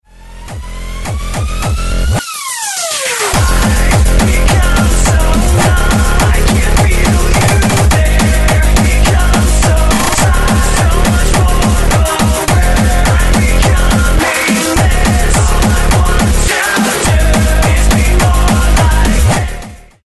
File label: frenchcore remix